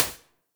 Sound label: EDM; Warframe; Bard; Bard Instrument Packs